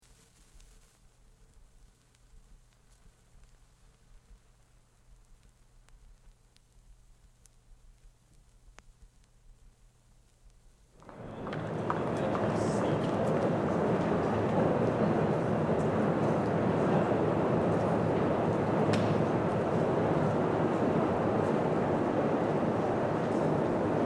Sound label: Bank Atmosphere on Concourse